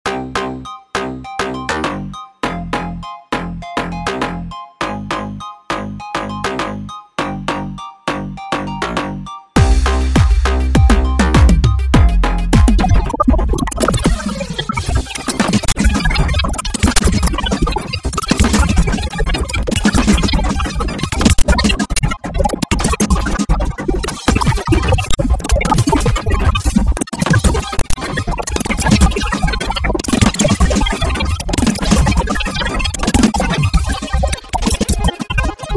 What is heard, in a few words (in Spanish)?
Reggaeton Cubano